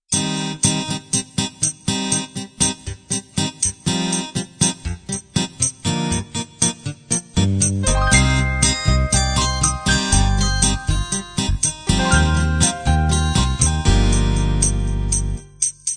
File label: bubble gum intro